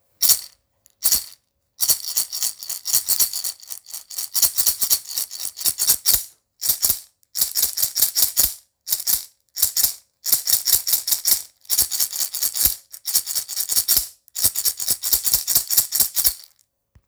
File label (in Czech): saman chrestidlo; samanske chrastitko